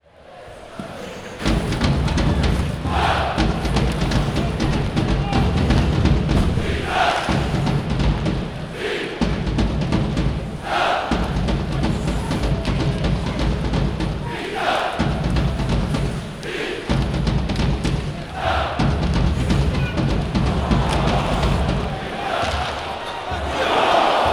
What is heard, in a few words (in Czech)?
HOKEJ PUBLIKA